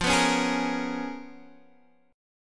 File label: Db 13th F, guitar